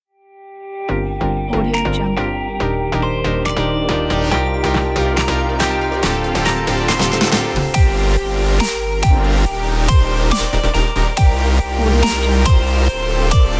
uplifting inspiring future bass logo

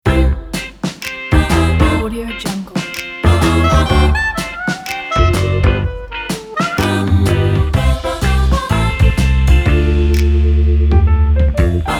logo cooking happy

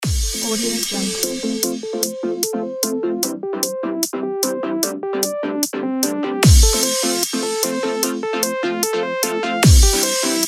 dubstep inspiring

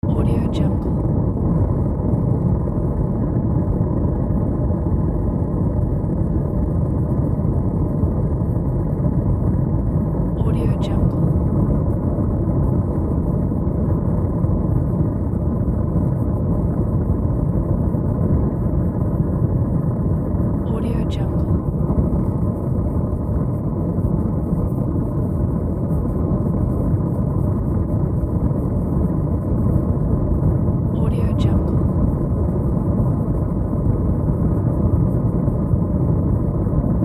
fire burst

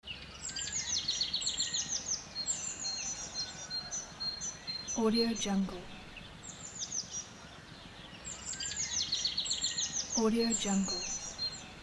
birds

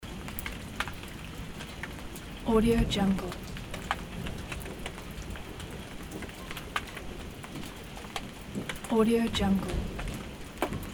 light rain

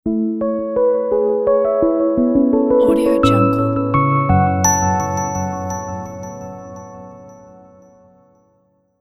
ambient piano logo; rigidrhythm

